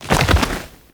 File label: Roll Forward Foley E; Enemies; Warframe; Teshin; DE